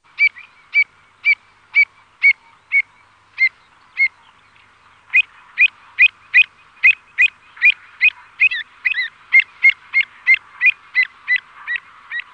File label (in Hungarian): Gulipбn; Madarak